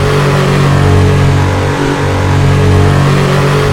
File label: in PMED; RedBull; AOS F1 2022